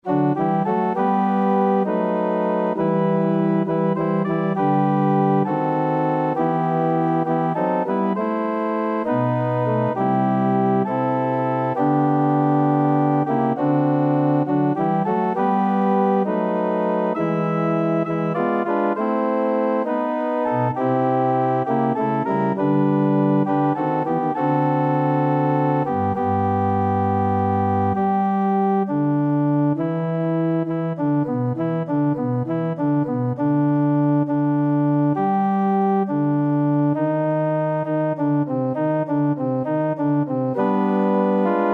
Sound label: organ